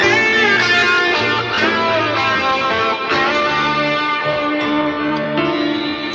guitar, Loops, Phonky

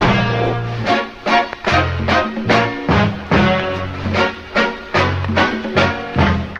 horns, jazz loops

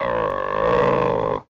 dog growl; Animals

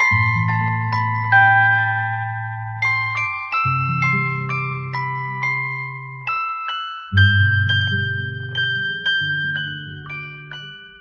keys and guitar, jazz loops

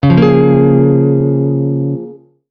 pleasant strum, Guitar; Notes

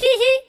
hee hee cartoon, laugh; ooh ahh

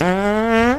fart horn; Farts

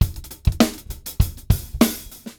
too many hats, drum loops, funky

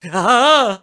"ha" japanese man; ooh ahh

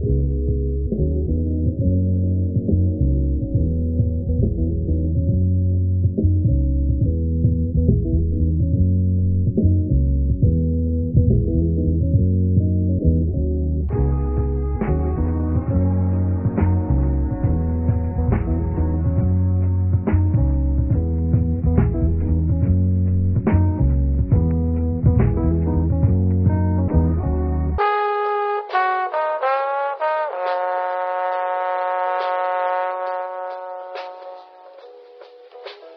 horns, Loops

